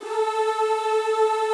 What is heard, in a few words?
A3 R, Classical Choir; Best Service; Extended Classical Choir